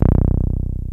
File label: 303 BASSES; Pro-Rec - Synthsonic